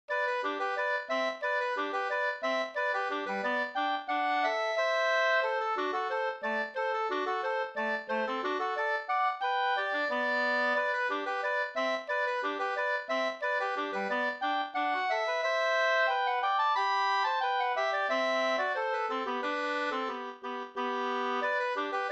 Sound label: polka; e Pettersson 2st